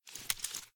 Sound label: draw; tacz; daffas arsenal